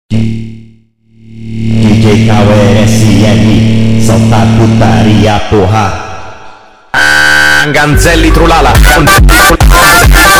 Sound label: FUNK bassboost